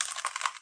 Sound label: cut pomegranate